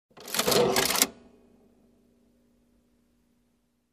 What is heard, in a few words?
Reset typewriter